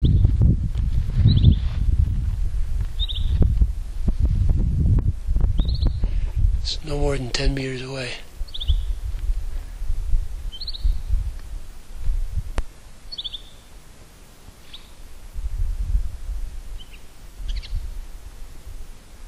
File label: bird; tanzania